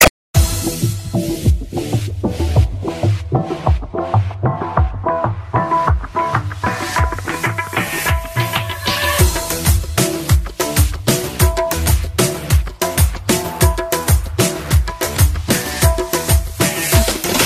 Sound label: Best tune